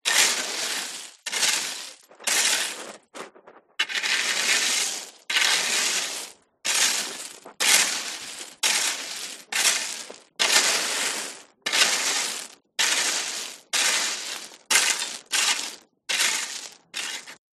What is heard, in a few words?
Raking Gravel